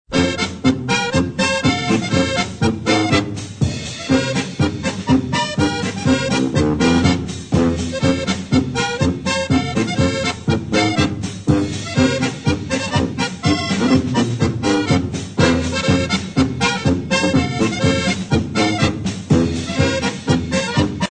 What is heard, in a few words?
BASS POLKA